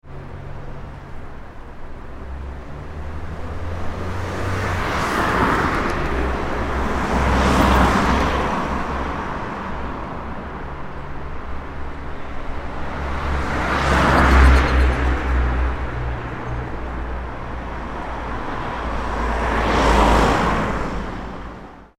ambience urban traffic main road med quiet dry, Traffic Urban Dry Main Road (40Mph); Traffic Ambience On Urban Main Road - Medium Quiet At Aprox 40 Mph; Traffic and Cars; Backgrounds; McKinney Sound